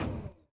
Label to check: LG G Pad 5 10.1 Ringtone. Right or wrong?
Wrong